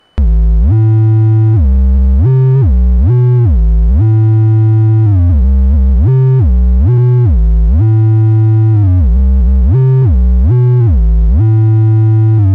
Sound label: octavius squeezer trap, bass